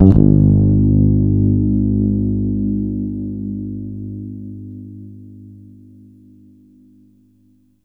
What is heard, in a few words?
ONENOTE, BASS ELEC KIT; Big Beat Samples & More; AKAI